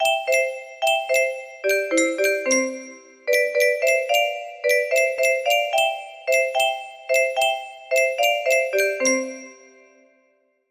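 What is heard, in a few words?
music box melody; kuckuck kuckuck rufts aus dem wald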